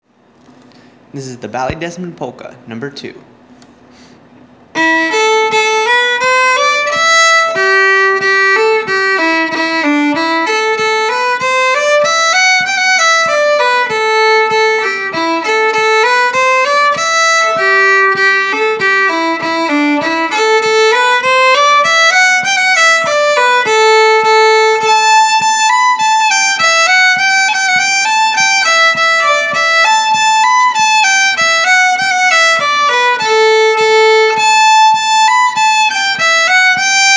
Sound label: Fiddle Polka; Ballydesmond